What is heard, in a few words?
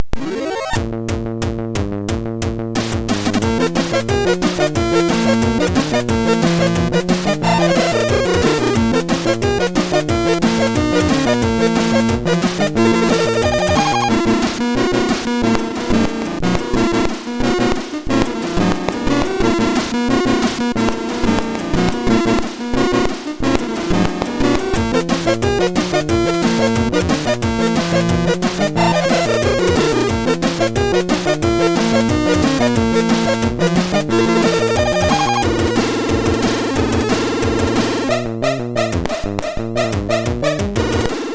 Battle sid MOS6581R4; HVSC; Final Fantasy 6